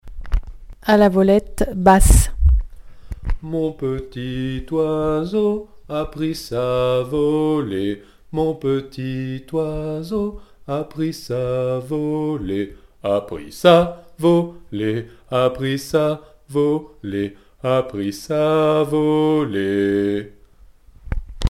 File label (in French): basse; A la volette